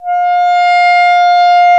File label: F 4, REED; AKAI S-Series CD-ROM Sound Library VOL-8